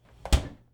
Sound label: fridge close